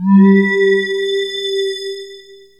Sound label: pad glass (G); Sonic Foundry (Sony Creative Software); Ugly Remnants VOL-2